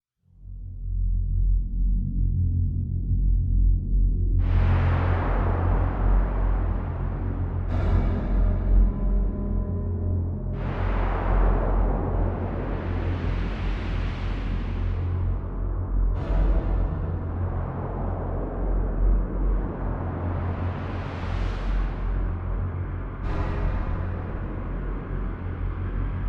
Factory Industrial Ominous Metallic Clanks Scary Dark; Horror; Scary Soundscapes; BBFX